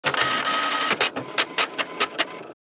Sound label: Cash machine printing receipt